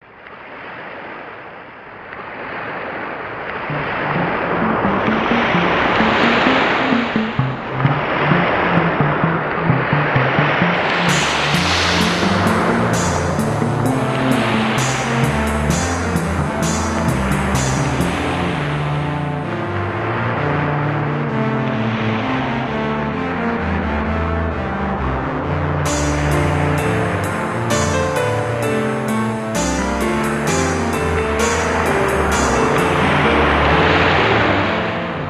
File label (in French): musique